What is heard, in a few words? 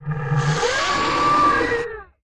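creature hiss howl, vocal elements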